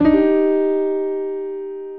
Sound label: Phrase Tense C Minor 120bpm, Grand Piano; MIDI Clips; Ableton; Factory Packs